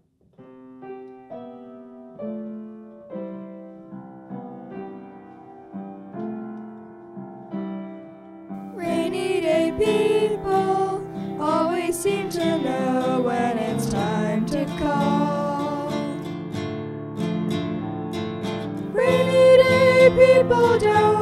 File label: Piano Guitar Vocal Duet